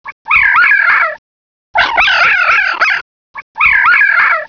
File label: red fox squall